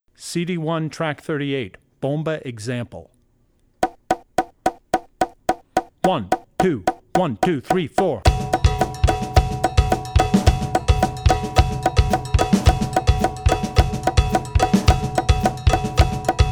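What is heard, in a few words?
Bomba, Drum Tracks; Drummer's Bible Tracks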